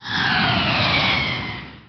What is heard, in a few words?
Airstrike; worms